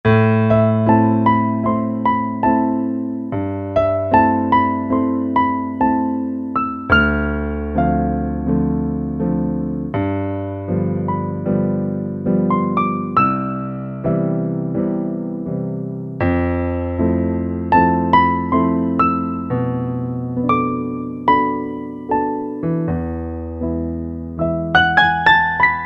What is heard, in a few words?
AP Dark, Yamaha TG500; Internal Voice I